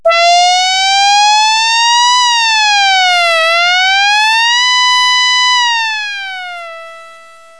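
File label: electric siren; HIGHTECH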